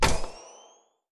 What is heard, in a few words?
Spotlight On; Lethal Company